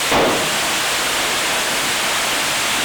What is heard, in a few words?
Ice Cave, On The Glacier